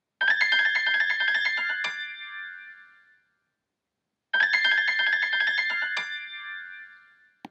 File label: Blues